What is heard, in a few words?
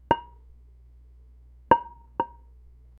objects